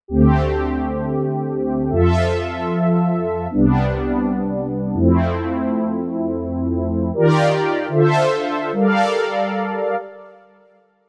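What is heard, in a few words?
Brass Oberhorns, synth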